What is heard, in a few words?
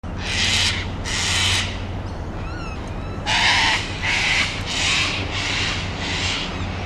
cockatoo, white cockatoo